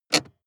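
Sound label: sports car interior handbrake pull very short; verkehr-transport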